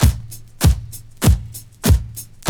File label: Loop; Sound & Vision - Gigapack I